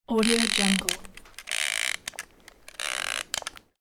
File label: timer clock winding up; domestic sounds